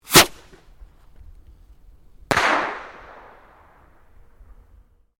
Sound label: Fireworks Skyrocket Launch Detonate, Domestic Fireworks; Fireworks (Sound Effects:Backgrounds)